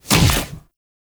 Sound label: Quick Draw Shot; weapons; Warframe; The New War; Omicrus